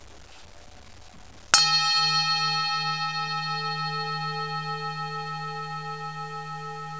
tap bowl

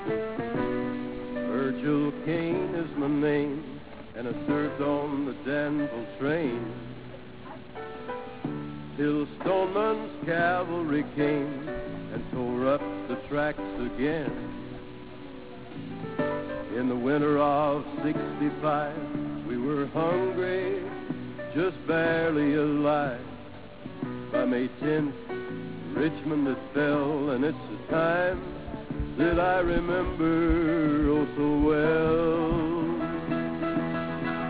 music

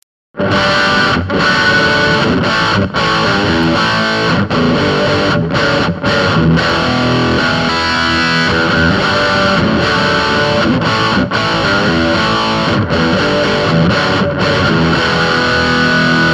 crunch tone, guitar

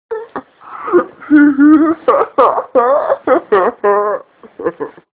Cackle

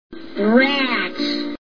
rats

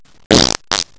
fart

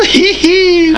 heehee

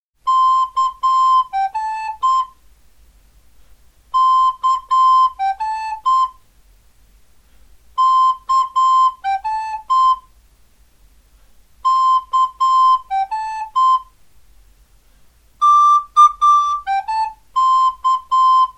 Blues Descant

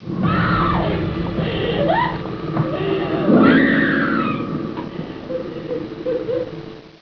screams

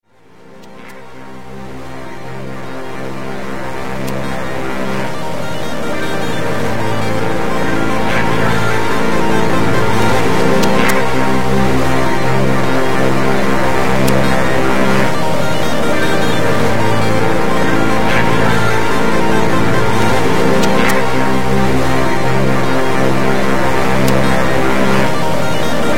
loop

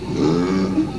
burp; the burping contest

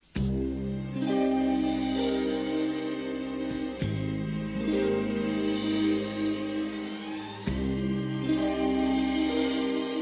cry

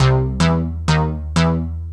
BASS

